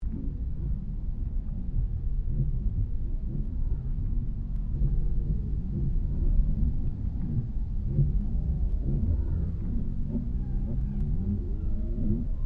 Created Ambience Submerged Dialogue, Artificial Sound Effects; Various Ambience created through sound manipulation in FMOD